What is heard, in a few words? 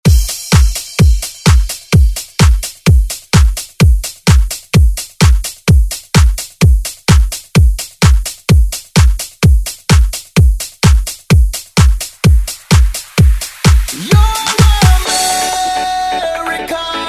Dance Redrum